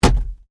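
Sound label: Glovebox Close, car; Hyundai Accent 2008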